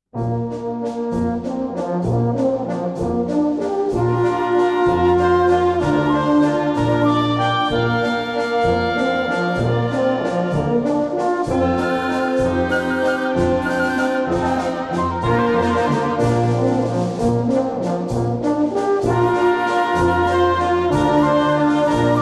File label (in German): walzer